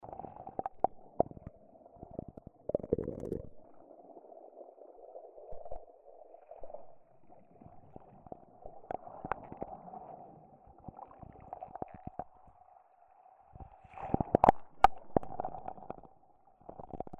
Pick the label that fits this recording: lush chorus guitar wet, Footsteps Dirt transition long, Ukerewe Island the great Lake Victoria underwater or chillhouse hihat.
Ukerewe Island the great Lake Victoria underwater